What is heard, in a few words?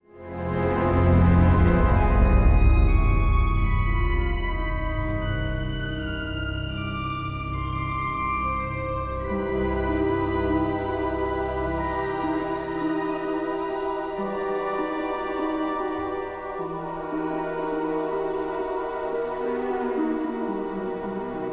suspense, music